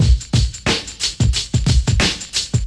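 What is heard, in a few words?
synthetic tambourine, Boombap Drum loops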